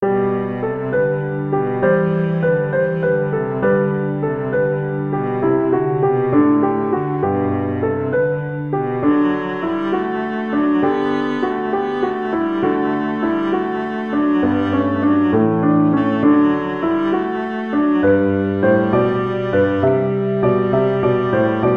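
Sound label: violoncello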